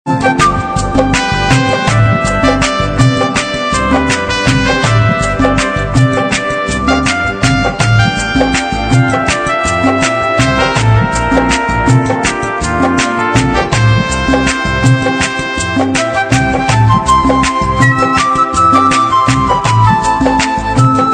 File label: instrumental BGM ringtone